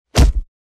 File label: fights